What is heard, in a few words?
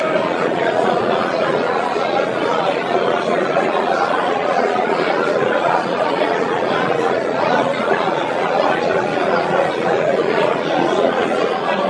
crowd